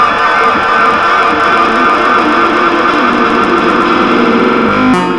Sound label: FX GTR, GUITARS KIT